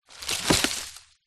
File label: tree log movement in undergrowth; zapsplat; foley